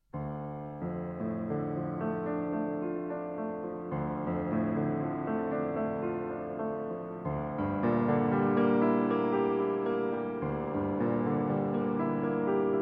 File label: Fantasia in D minor